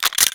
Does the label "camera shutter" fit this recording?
Yes